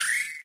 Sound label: robot step; minetest; techpack